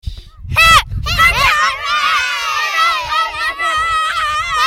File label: hip hip hooray